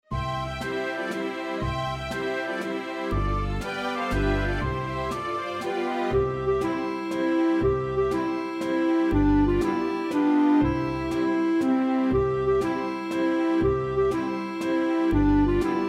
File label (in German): Lied instrumental; Schneeweisschen und Rosenrot